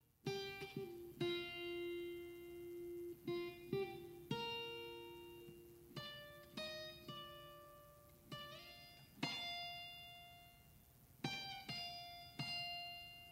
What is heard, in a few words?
Instrumental; calvaryministries